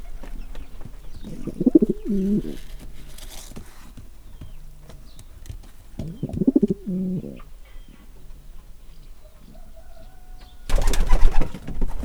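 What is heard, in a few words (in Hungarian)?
galambturbékolás rebbenés; parasztudvar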